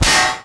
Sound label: hit metal